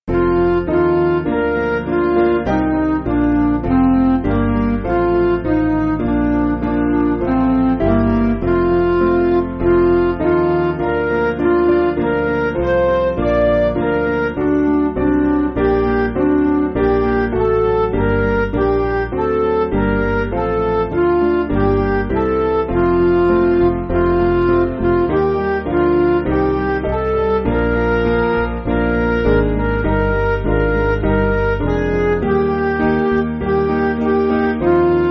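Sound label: Midi, Music Backing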